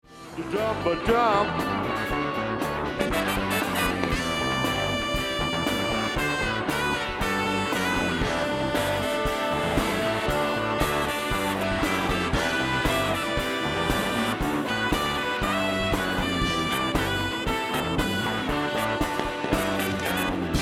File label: Horns